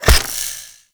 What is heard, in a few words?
Rain Of Arrows Impact; Warframe; Duviri; Dax Archer; Abilities